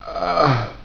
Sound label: DYING; BattleSNDS